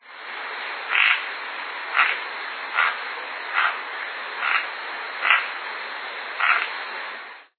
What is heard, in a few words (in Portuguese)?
anambe branco de bochecha parda